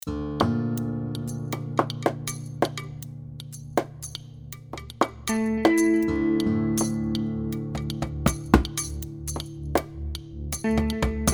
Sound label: Light and Guitar